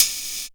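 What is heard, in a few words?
closed hi-hat, Dance Synths, Percussion; Mad Kit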